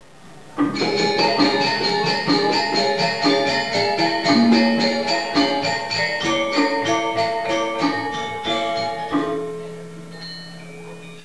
suonerie musica ritmica

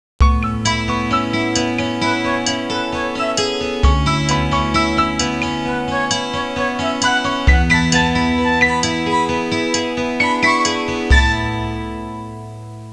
suonerie musica hawaiian

